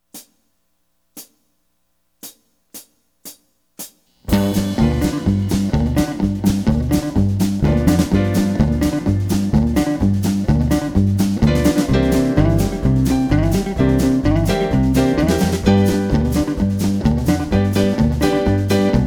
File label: Two Feel Blues in G